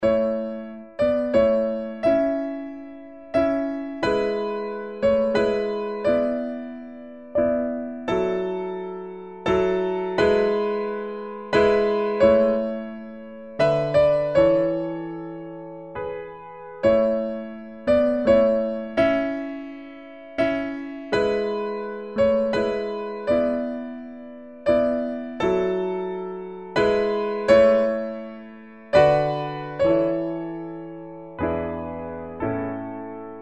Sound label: piano; la flute enchantee